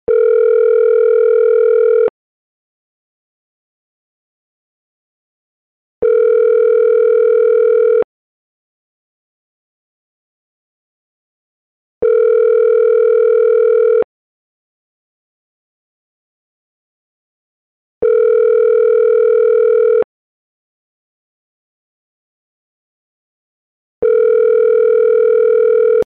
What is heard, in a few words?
ringback